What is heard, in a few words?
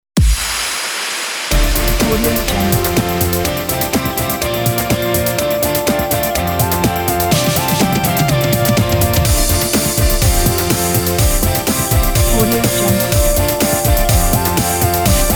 fashion idents pack